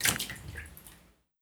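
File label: footsteps water; ASHIOTO MIZU B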